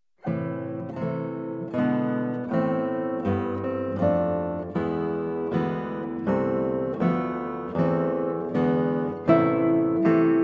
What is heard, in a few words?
Larghetto, Guitar; Recorder Sonata in C Major HWV 365; Handel